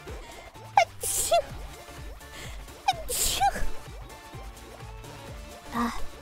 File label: Sneeze